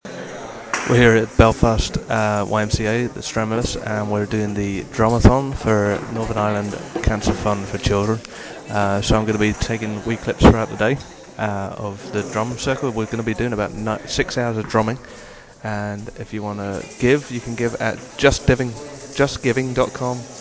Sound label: drum a thon